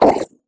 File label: Multimedia Internet CD-Rom Flash Whoosh